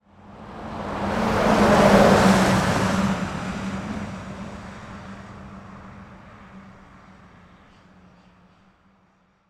transport truck passing europe

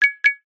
cartoon blink marimba

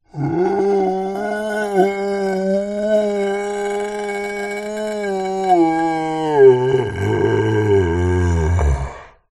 horror zombie male long groan

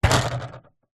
table soccer game bar spin

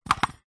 foley battery tester plastic set down carpet; zapsplat

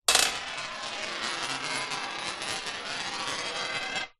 money coin roll on glass; foley; zapsplat

